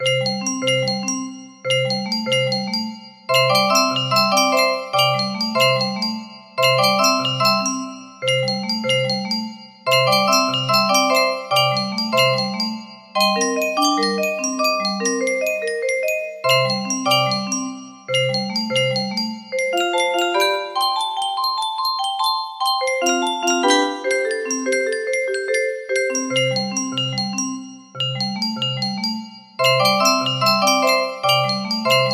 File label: in c major, melody